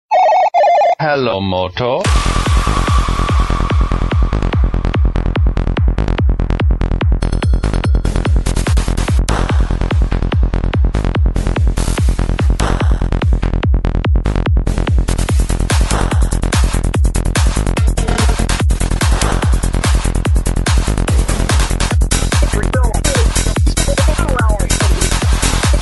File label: retro psy mix